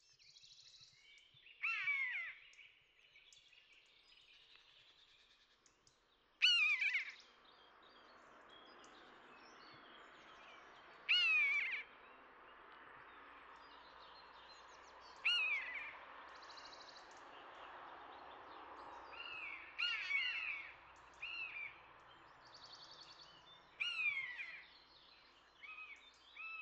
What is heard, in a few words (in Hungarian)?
egerészölyv hangja